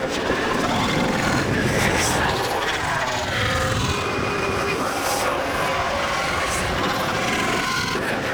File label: Infested Control Ability Loop; Warframe; Infested Alad V